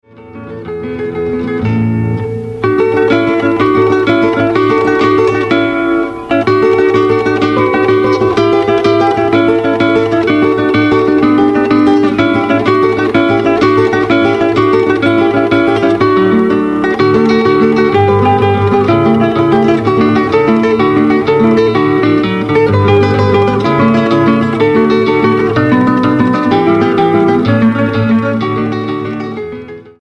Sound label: Jig